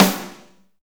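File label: snare, drums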